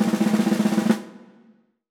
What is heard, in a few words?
Roll, Percussive FX; musicradar; cartoon caper